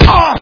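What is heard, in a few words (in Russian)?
ворчание мужской крик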